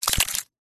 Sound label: Mechanical